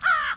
caw, Harpy Voice; OldThink

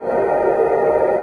wraith phase

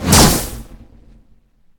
wand teleport

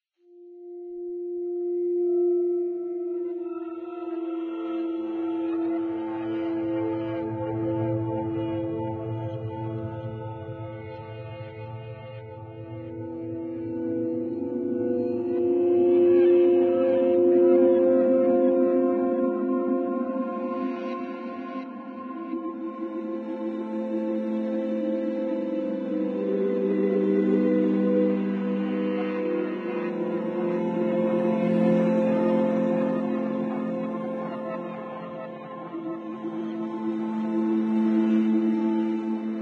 space, Ambience

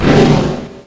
swing, Mjolnir; Magic

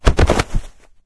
body drop; Items handling

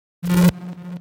teleport; syndicate; Devices; OldThink

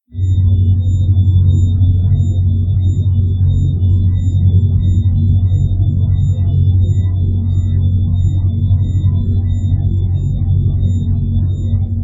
anomaly, Ambience; Anomalies

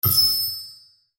recall, Magic

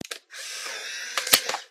polaroid, Machines

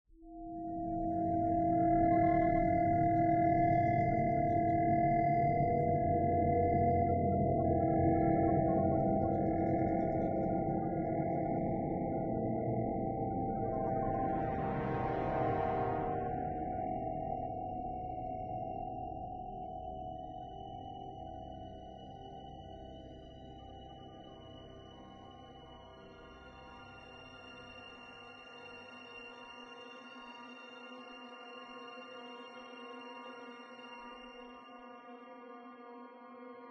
station, Ambience

